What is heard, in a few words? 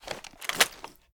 ar reload empty dmr rotate; weapons; gmod; viper; scharlie